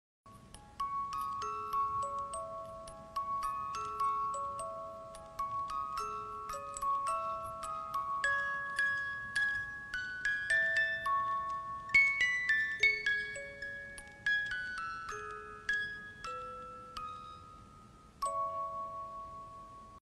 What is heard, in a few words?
music box; Inno della vita